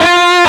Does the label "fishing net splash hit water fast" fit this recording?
No